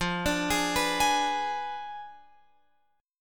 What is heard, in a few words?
F Diminished 7th F down Guitar Open F; arpeggiated